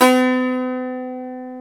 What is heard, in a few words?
SAZ, Eth Plucks; Pluck Duo